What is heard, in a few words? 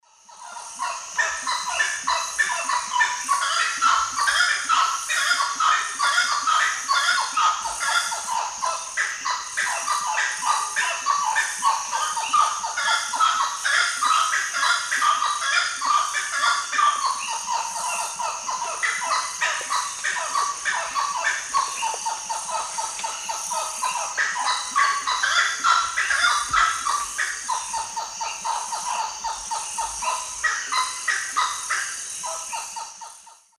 Aramides cajanea, duet; S. America; Eastern Lowlands